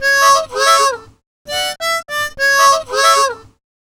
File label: Harm 098bpm A, Harmonica